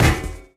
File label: physics turret impact sm